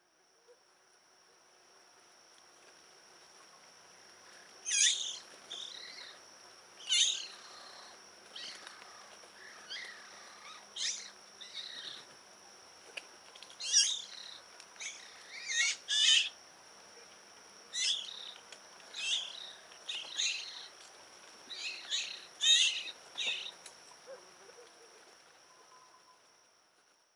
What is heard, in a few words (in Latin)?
Pica pica; SETE